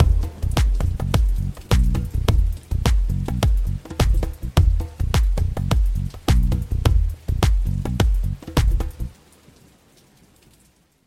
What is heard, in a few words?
djembe 6 8; bastaki; percussion loop